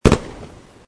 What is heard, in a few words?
fireworks